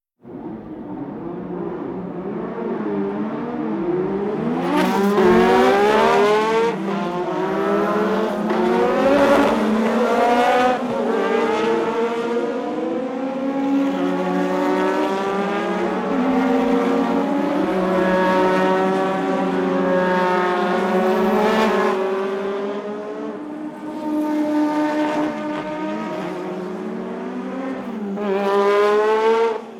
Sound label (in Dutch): Formule 1 Race Start; KMP Film Video Sound Effects